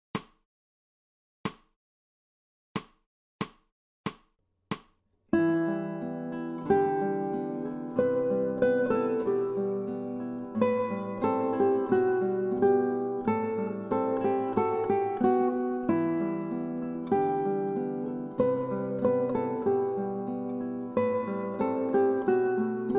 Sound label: Arpeggio